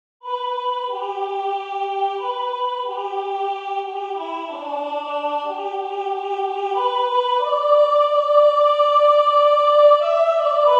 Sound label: Soprano; GOSPEL TRAIN